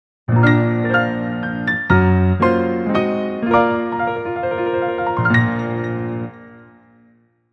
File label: Perfect Battle Victory Jingle; Bayonetta Origins